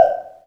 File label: Korg M3R Low Wood Block